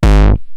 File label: Roland TR-909 BD; Drums; BD Selection